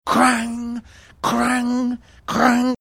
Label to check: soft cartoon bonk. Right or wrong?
Wrong